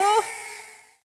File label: Witch Recoil